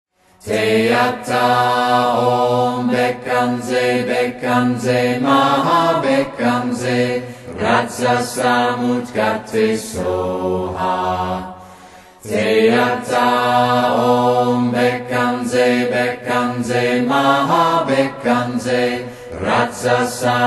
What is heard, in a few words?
Medicine Buddha Mantra